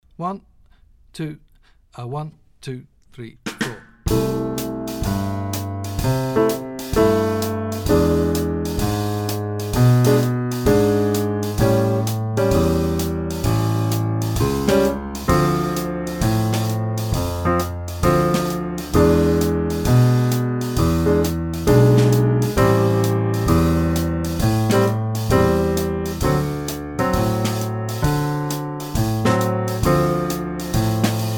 Two feel Bass Line with guide tones in right hand, teclado; jazz iniciante